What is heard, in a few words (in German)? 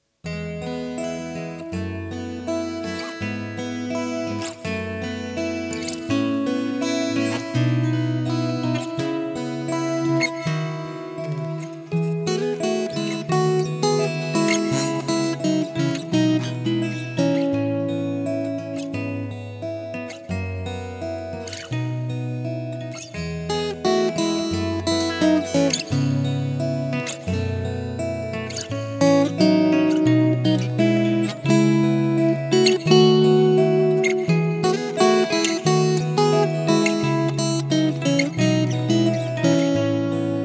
Guitarrenstück